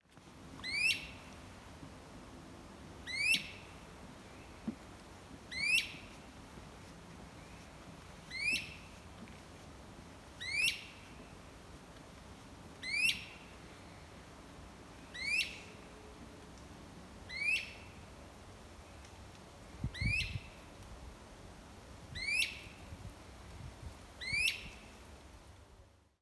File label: Great Crested Flycatcher Myiarchus crinitus